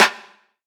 subtle reverb snare single hit f key, snare drum sample tuned to the F note